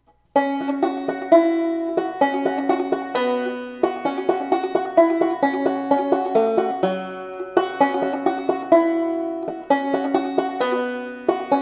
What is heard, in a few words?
spooky banjo